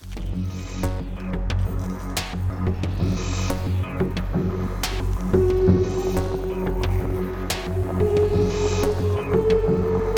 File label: Lose Loop; Axon TD Uprising Tower Defense